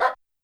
Dog; YAMAHA RM1X

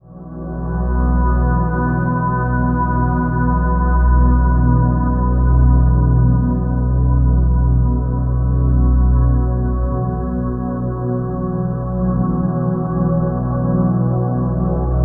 DRONE, guitar; Spectrasonics; Bizarre Guitar; SOOTHING

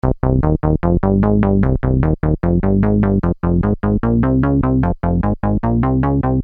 Syn-Bass m Cm, Techno Synth Loops, 150bpm; Sonic Foundry (Sony Creative Software); Signals I

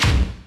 IND; Zero G; Man Machine

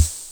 BD HH OPEN, Eminent-Solina Rhitmix drum machine; 300 Drum Machines

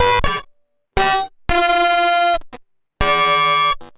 Guitar Loop, 120BPM

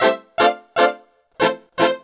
Brassy, Funk Keys; Sonic Foundry (Sony Creative Software); Synchro Funk

